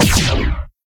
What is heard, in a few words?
laser medium; ufoai; weapons